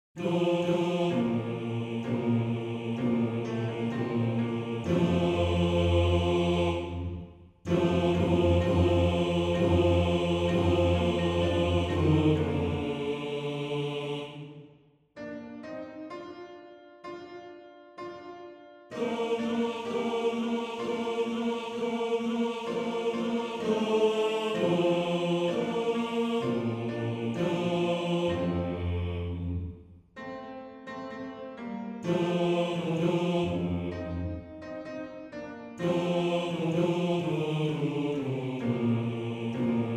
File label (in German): Bass; Alles Leben; Bass 2